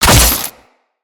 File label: Condemn Spectre Impact; Warframes; Priest